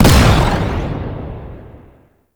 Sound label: Missile Barrage Explosion C; Enemies; Weapons; Warframe; Arachnoid Camper